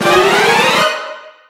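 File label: Jingles battle encounter; Otome the Exorcist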